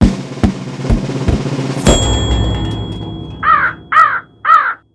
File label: LOTTO BAD